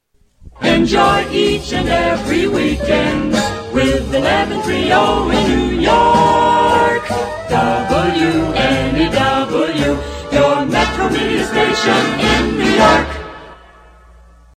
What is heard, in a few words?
Radio Jingle; WNEW AM 1130 NY; Weekend